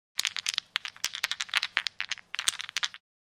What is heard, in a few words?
Casino game dice shaking in hand